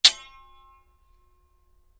Sword Clangs Sword, swords

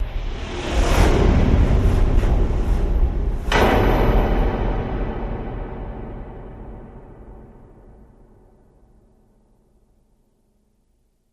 Arcade Logo Whoosh

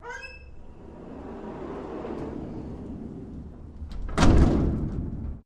Garage Door, Metal, Close

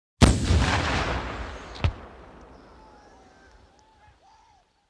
Shldr Mount Missile R, missile exterior

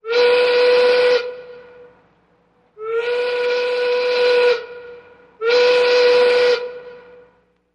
Train Horn Freight

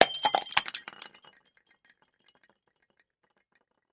Kitchen Glass, kitchenware

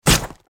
Bullet Tears Vest

